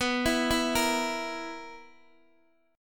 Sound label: B Major 7th Suspended 4th B down Guitar DADGAD